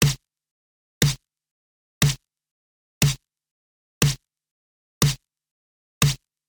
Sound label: Snare sample dry